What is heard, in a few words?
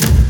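GATE KEEPER, AMBIENT DRUMS; Spectrasonic Distorted Reality 1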